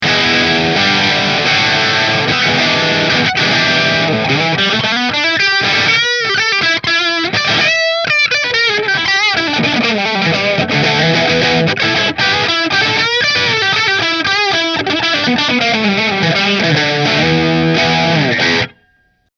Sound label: Aria Pro Bridge Marshall